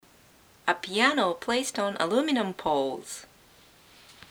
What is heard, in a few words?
a piano